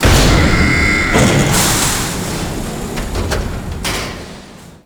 Dojo Elevator Stop; Ambience; Warframe; ModQuest; Teshins Hideout

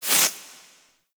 Undead Owl Close Flap; Dante

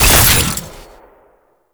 Alt Shot; weapons; Warframe; Fulmin Prime

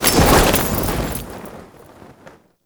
Paratrooper Balloon Drop Off; Warframe; Lasrian Soldiers

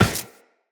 Run Glass, Footsteps; Tenno

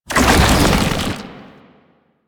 Babau Strafe, Foley; Proto Infested

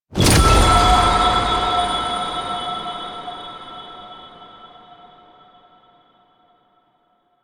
Diorama Box Open; Glass Quest

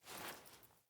Foley Action Default Block Remove; Player

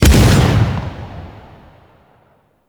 Melee Slam Hit; Warframe; weapons

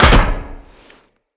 land heavy metal perforated, footsteps; Characters; Tenno

